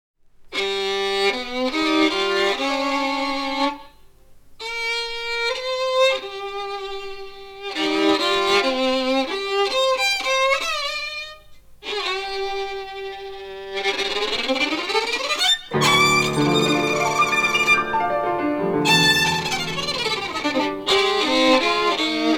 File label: Violin & Pianoforte; Scerzo